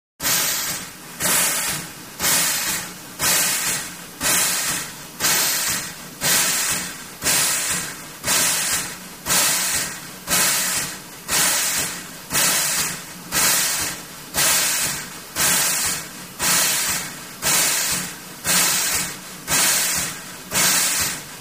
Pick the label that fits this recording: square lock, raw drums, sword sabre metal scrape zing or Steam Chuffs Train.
Steam Chuffs Train